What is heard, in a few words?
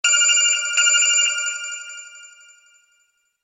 Classic Phone